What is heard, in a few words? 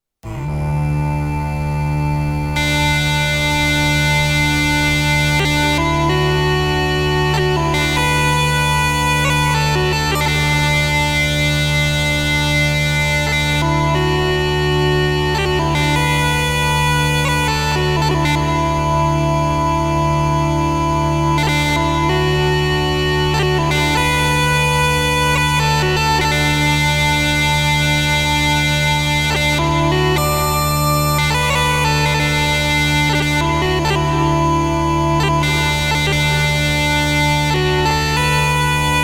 techno pipes